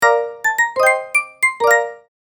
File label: Marimba win success level complete mobile game